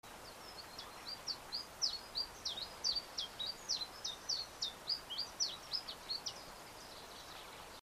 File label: abiet in tristis; Coton2014